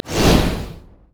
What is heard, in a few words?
flame breath human; LoL; shyvana